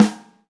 Bassy Steel Snare Drum Sample A Key, snare drum sample tuned to